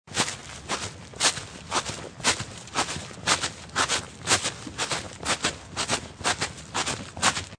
Leaf Skip, Skipping through rustling fallen leaves.; Part of Skipping (Sound Effects:Human)